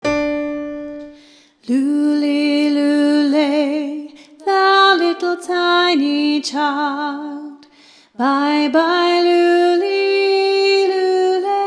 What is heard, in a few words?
Coventry Carol Middle